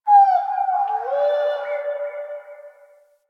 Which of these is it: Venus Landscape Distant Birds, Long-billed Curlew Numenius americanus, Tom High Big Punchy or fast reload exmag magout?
Venus Landscape Distant Birds